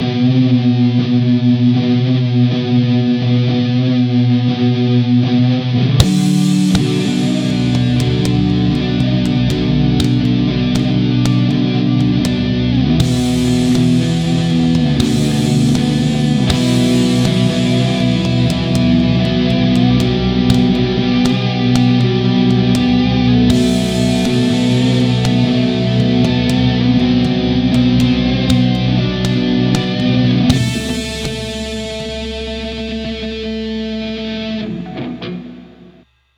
FGN J Standard Mythic 7 neck

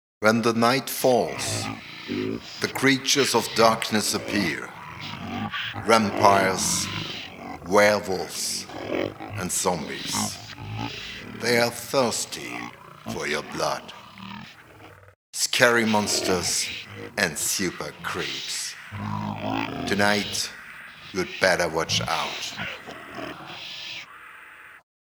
Eventide H3000 Scary Movie Voice

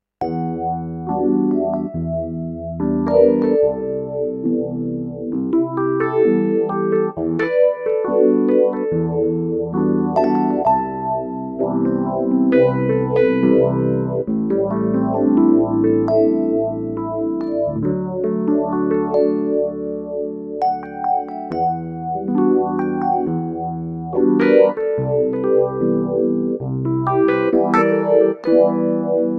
Tine EP Phaser

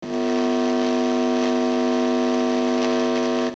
Noise

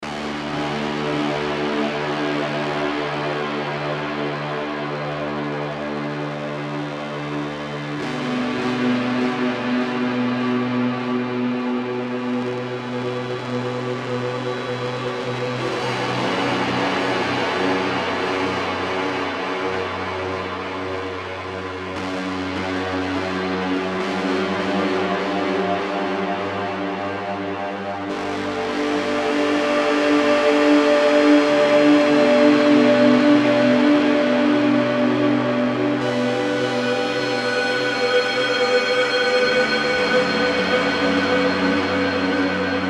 Mooer GE 300

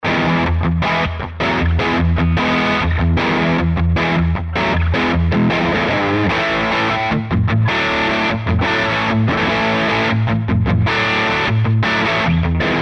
Bold Warm; Gojira Plug-In Suite

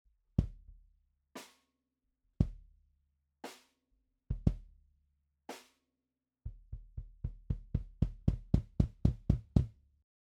BASS DRUM

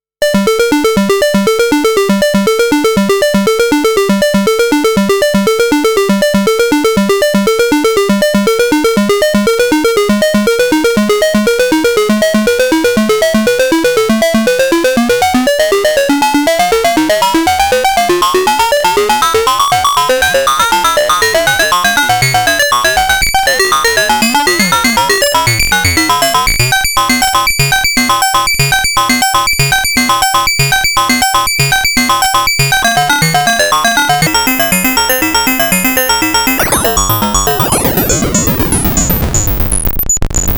SequenceFM

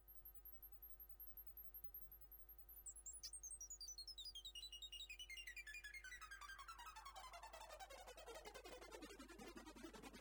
LFO Modulation

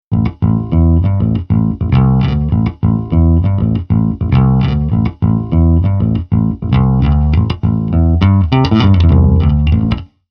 Mayones Jabba 4; HPA

